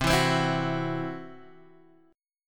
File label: C Major Flat 5th C down Guitar DADGAD